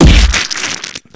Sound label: Kill Hitsquish; Friday the 13th: Killer Puzzle